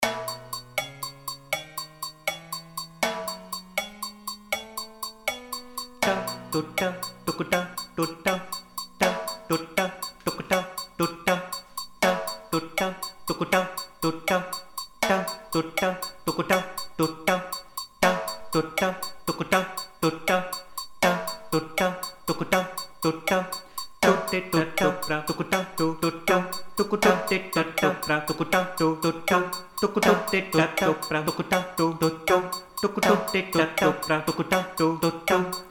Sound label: yoruba loop